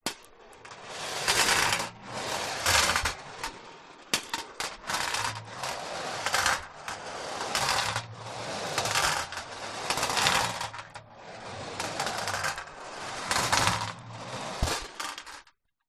industrial metal ball bearings many roll on metal sheet